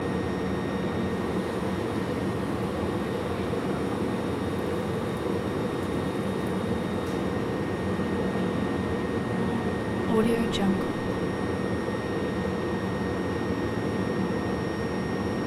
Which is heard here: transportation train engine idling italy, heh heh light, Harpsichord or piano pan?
transportation train engine idling italy